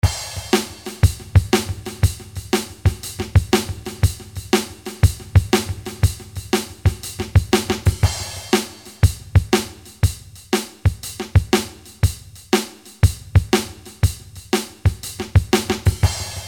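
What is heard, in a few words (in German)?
Drums mit verändertem Reverb; Zoom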